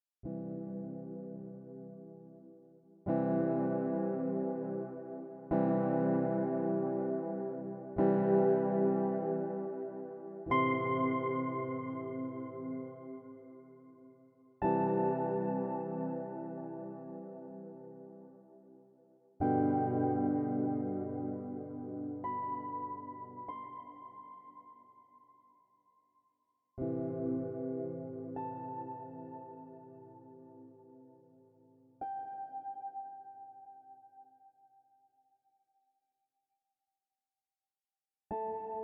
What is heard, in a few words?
solo without strings